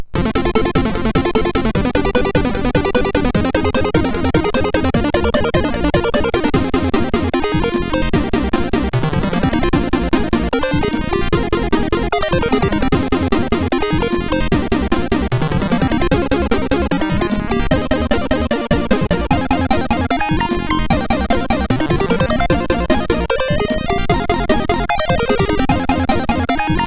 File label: Robot Jet Action, MOS6581R4